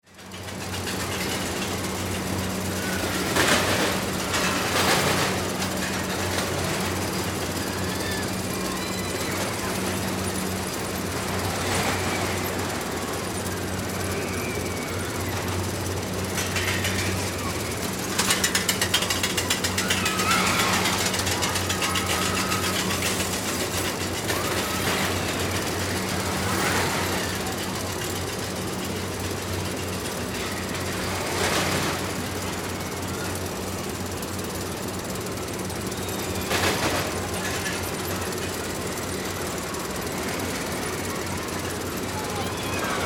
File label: Ambience Carnival Roller Coaster Rides; Games